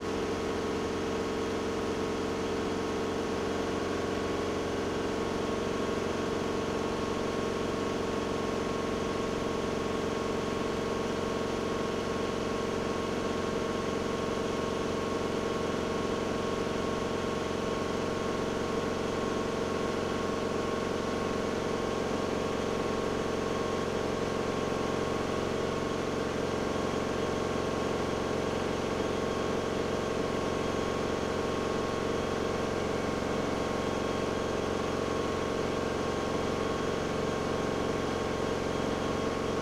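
Robinson, R44, Onboard, Take Off, Fly, Engine, Right; Raven II 2004; DPA4062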